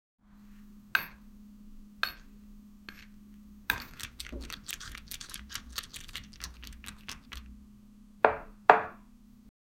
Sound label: a girl slowly touches the, touch